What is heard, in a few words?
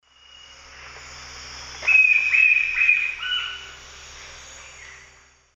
Celeus flavus, call; S. America; Eastern Lowlands